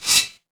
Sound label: impact sword draw